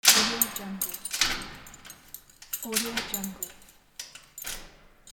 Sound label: metal door lock